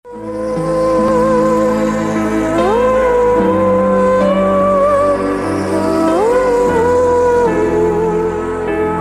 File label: telagu new ringtone